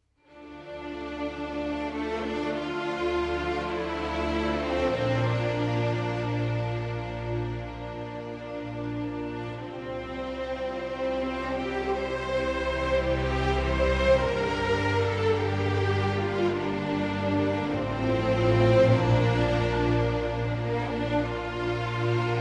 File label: Instrumental